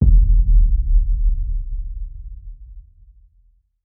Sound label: titan muzzle sub hit, weapons; kaiju; Stellaris; biogenesis